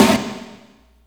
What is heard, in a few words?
SD, dance drums hip